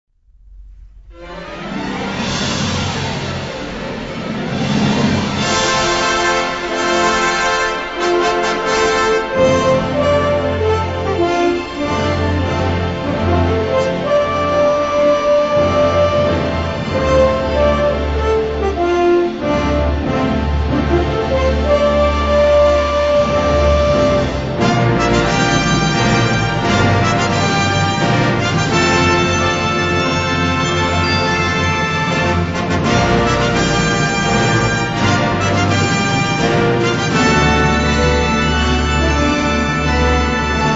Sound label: Theme Song